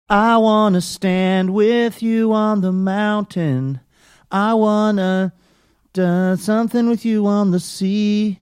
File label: singing